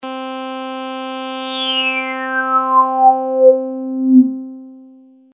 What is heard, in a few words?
puls cutoff lp resonance